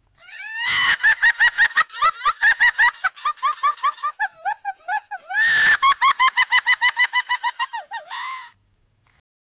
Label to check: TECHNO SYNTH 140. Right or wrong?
Wrong